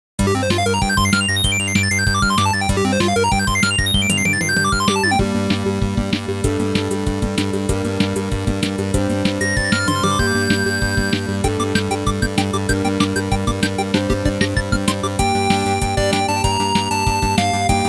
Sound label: chip